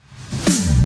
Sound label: Big Snare